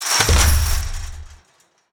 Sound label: objects, World of Tanks